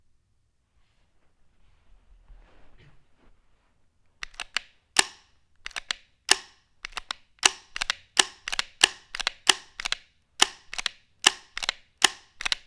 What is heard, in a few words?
vm-68 cock long barrel, guns